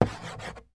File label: office chalk stick single stroke on chalk board; sound effects one